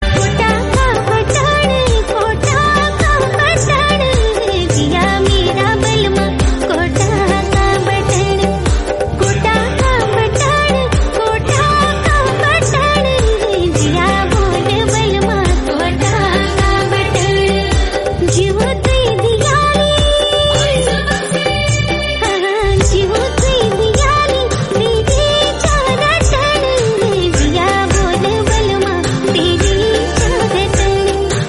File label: Garhwali Song Ringtone